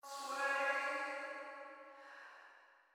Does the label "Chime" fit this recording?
Yes